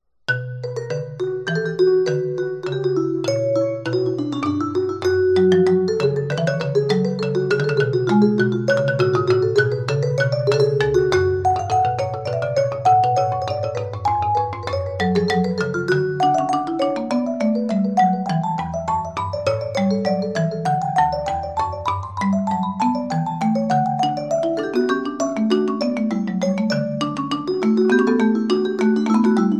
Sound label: BWV 786 Invention In H Moll, Mallets; Bach for Mallets